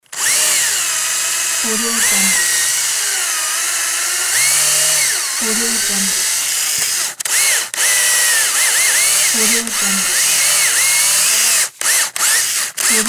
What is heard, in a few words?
drilling loop; fascinatedsound